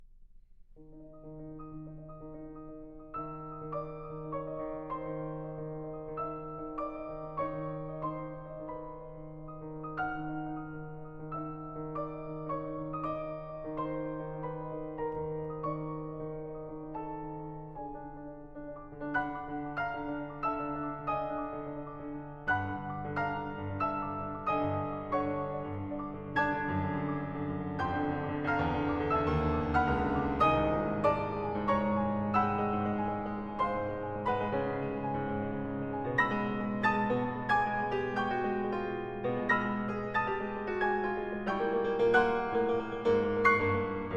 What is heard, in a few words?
etudes pour piano; automne a varsovie